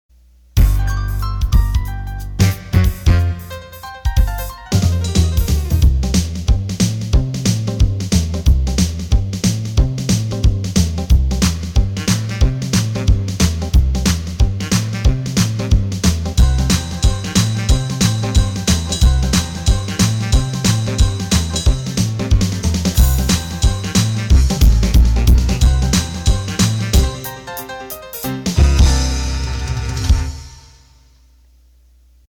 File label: Boogie, accordion